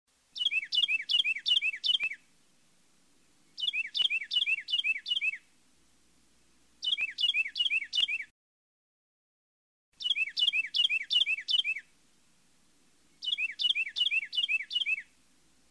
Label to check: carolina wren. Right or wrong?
Right